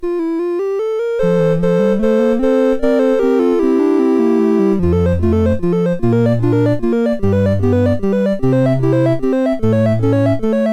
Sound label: Prelude No4, MOS6581R2